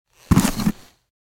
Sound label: mask use; STALKER; IWP; item usage